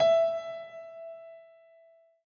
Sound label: dial tone piano; Oppo R7